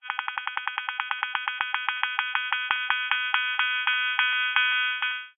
science fiction radio receiver transmission interference burst blips slowing; zapsplat